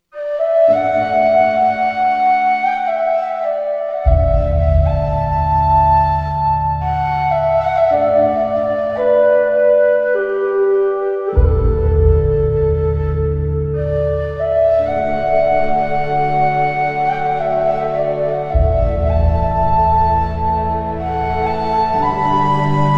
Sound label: irish whistle